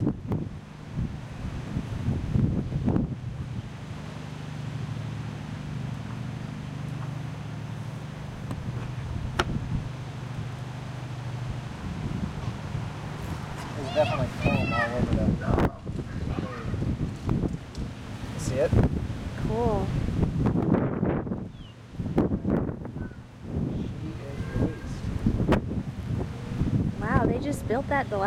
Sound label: remove queen cage, bees